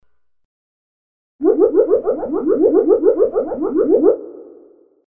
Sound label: Digital Whoops; Sound FX